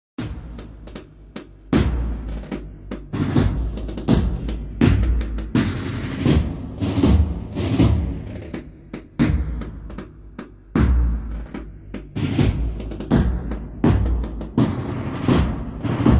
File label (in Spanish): SONIDO DE TAMBOR